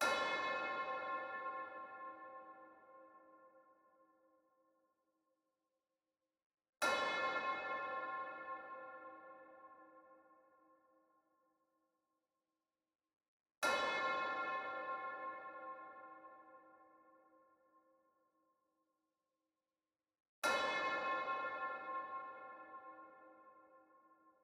141 BPM C Min Bell, Drill; Cymatics; Red Eyes; 2022 Melody Collection

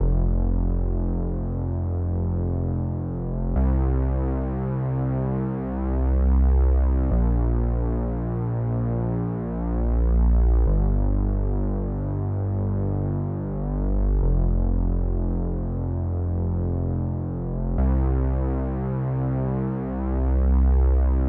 135 BPM C Min Bass, Hip Hop; Cymatics; She Goes

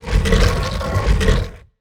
Stone Door Open; Game Essentials

